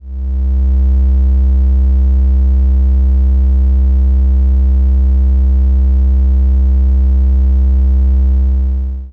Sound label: uniCycle Tri